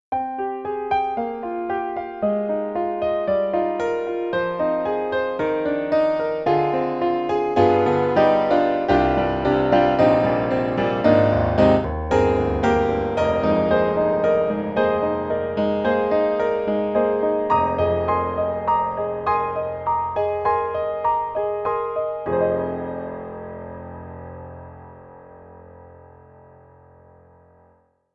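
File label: Wide Stereo Grand, piano; Roland; SRX-11; XV-5050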